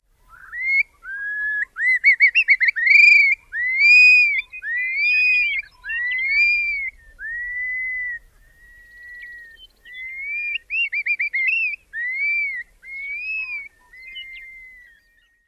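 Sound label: flight song, bird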